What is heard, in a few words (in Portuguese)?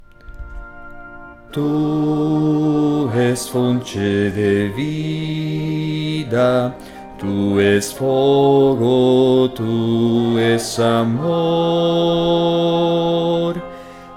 baixo